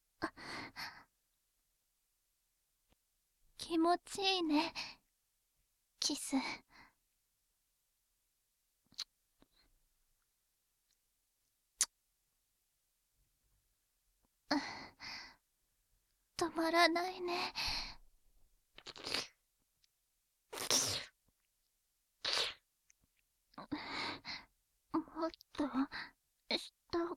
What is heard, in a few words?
kiss